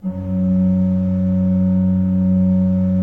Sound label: RFRP F1, Cathedral Organ, ROHRFLOETE; Propeller Island